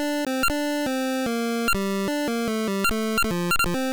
ui beep; 8 eyes

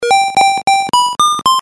nut complete; snail against squirrel

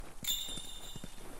communication sound button press cue

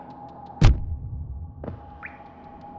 soundscape damage effect; hollow knight

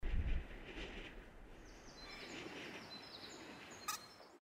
soundscape forest; gris

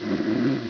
snoring; metal gear solid

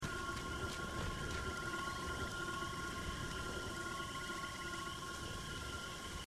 ambient; risk of rain 2

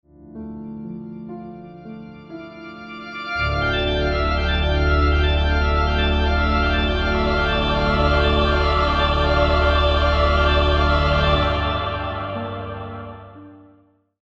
orgue prevent; gris

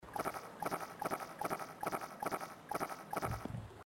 unusable item; hyper light drifter

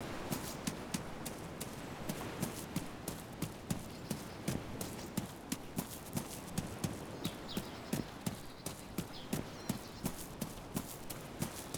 walking stone; dayz standalone

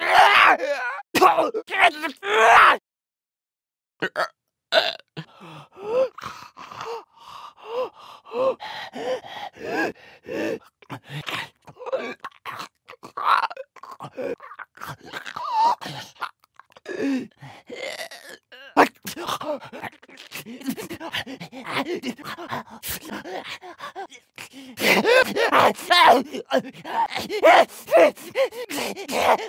male stalker; The Last of Us Part II

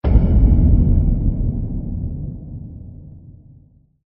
new location sound effect; elden ring

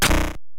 hit; kirby s dream land

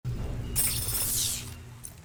inventory open; cloudpunk

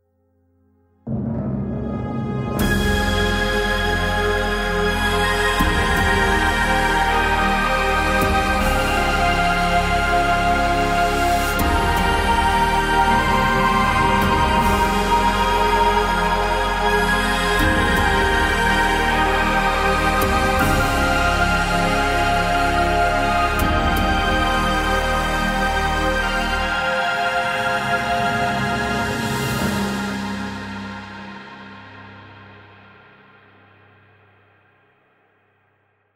sparks; gris

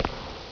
step

